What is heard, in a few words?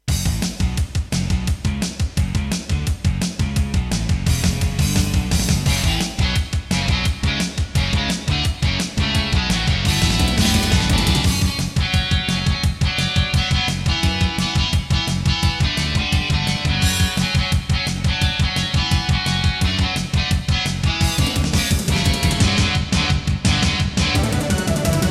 Intro, Yamaha MU2000EX XG MODE; Duke Nukem 3D